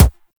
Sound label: Weapon Punch Pistol; Warframe; Weapons